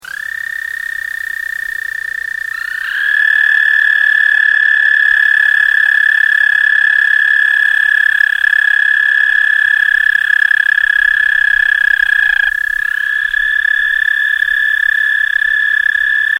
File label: Dwarf Toad